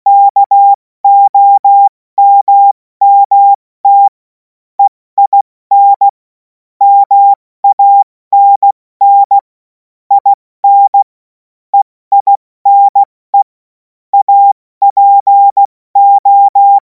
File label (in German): Witz, cw morsen, 70 F80; Tempo 70 F80; hamradio; Morsekurs